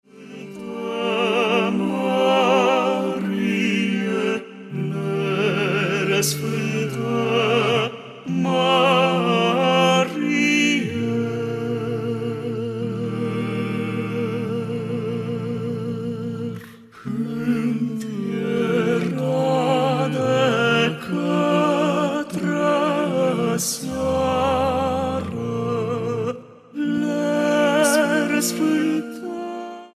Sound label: Voice Quartet; Se preumbla Maica Sfanta; craciun